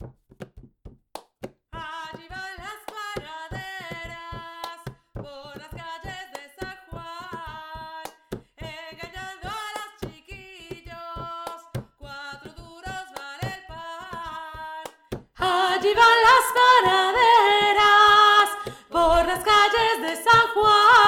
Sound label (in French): VOIX AIGUE; LAS PANADERAS